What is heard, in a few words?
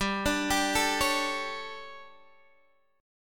D Major 7th Suspended 4th, guitar chord